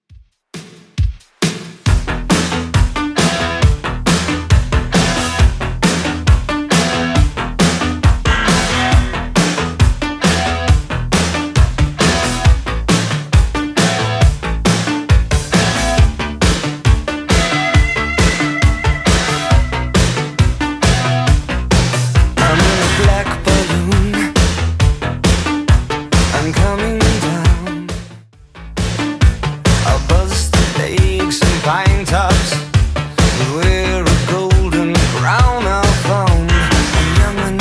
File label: 80s Redrum 136bpm